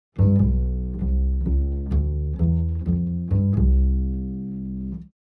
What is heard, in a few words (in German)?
vorgezogene achtelnoten